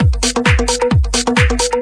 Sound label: 132 congaman, drum loops and breaks; 131 to 140 bpm; samples-ghost